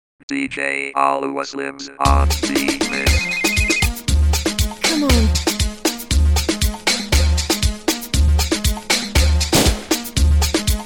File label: Bad Beat